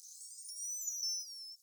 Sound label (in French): Sifflement; globi